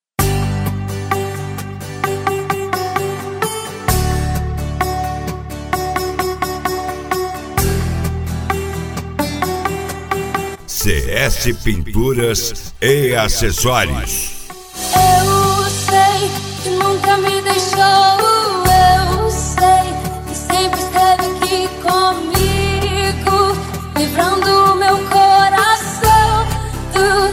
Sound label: remix gospel